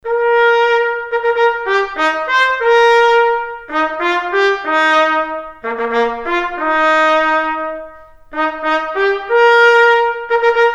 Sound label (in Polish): hejnal